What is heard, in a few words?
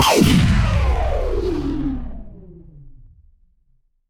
magic scifi laser